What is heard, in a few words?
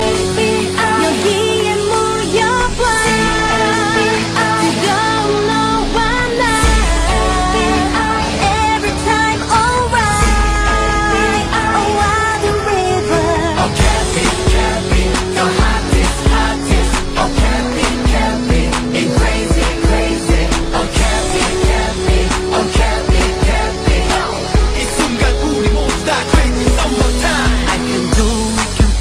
song